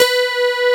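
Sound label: Metal Bass; awe32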